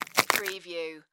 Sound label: Carrot Crunch Bite